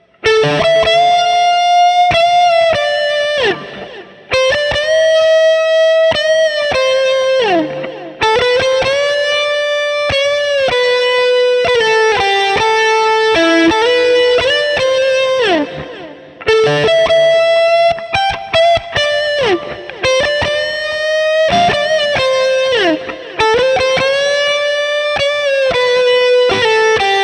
Guild S300AD Neck, 1959SLP Treble; sunburst Guild S300AD guitar

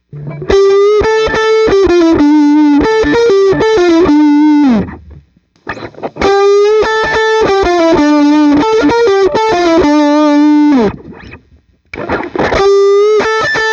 Les Paul Stacked Off 80s 90s Both, Treble Booster; Guild 1984

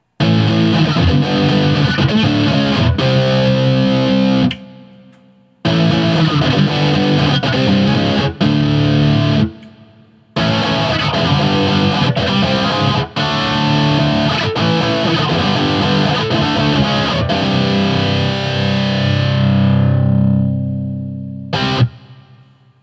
1981 Guild S300 E Barre; Axe-FX Ultra setup through the QSC K12 speaker recorded into my trusty Olympus LS-10

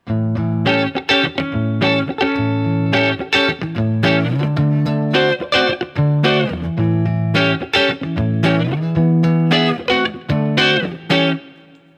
Guild 1998 Starfire 4 7th; guitar

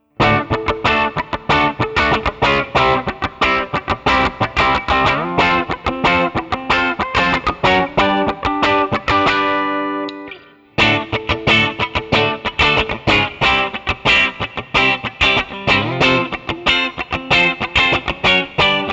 Guild S65D Blackface Twin A

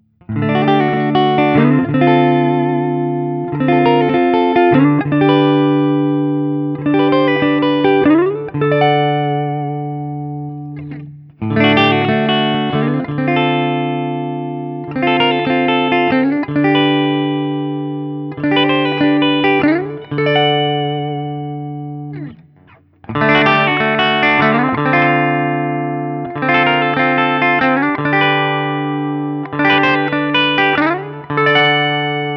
Guild SFIII ODS; 2016 Guild Newark Street Starfire III

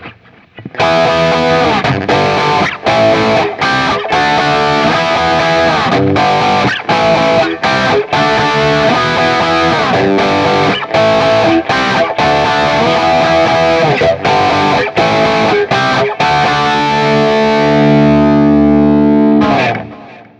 Guild S300AD Jumped Plexi Phase; sunburst Guild S300AD guitar; distortion

